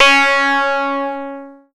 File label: C#, Synclav FM PLUCKED; Big Fish Audio